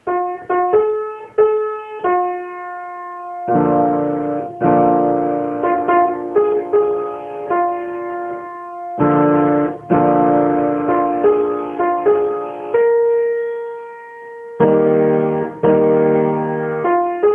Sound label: blues